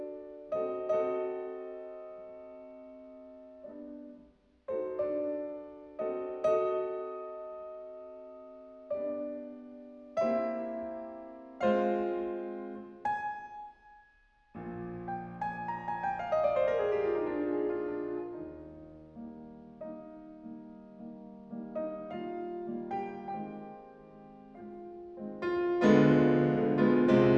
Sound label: classical